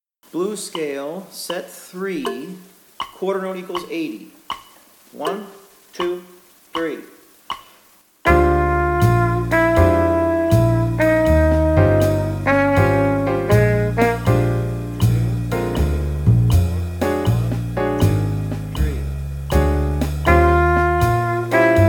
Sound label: Play along Blues Scale (with rhythm, qn=80); C Blues Scale, Set 3; with rhythm section; Chwalik